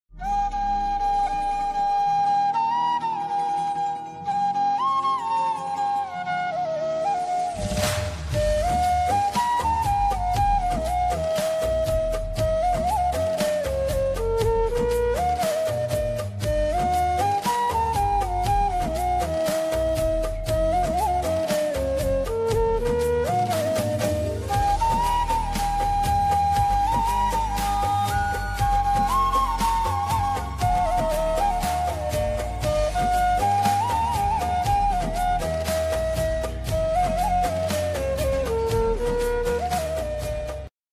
flute ringtone old evergreen song ringtone new instrumental ringtone